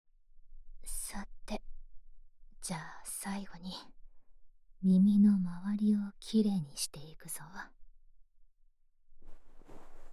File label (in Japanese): 梵天で右耳の耳掃除